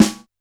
E2 SD Jazz, Jazz Kit; Yamaha PSR-E323

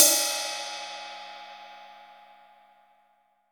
16 RIDE

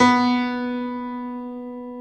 B2 PNO; Keith Emerson Signature Series

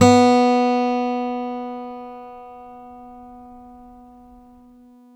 STEEL P B2, Synclavier, GUITARS; Synclavier Sampler Library

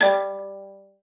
G3, VIOLA FINGER; Xsample VOL-5

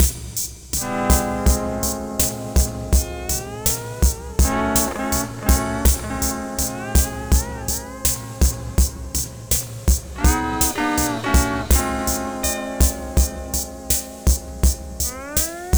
Sunrise Full-C, Construction Kitz Lounge; Zero-G Kocktail Kollection; Sunrise Mixes 082

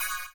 CYM, Ethno Techno; ILIO - Ethno Techno

